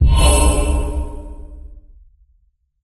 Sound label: scatter Wheel Appears; netent